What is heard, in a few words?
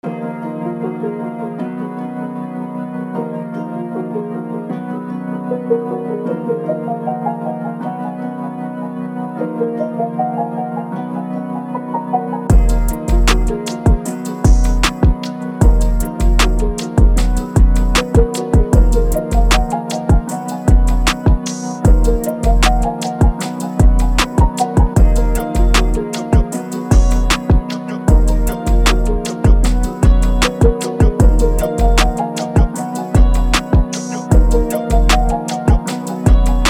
Trap Melody Loop Dm 154 BPM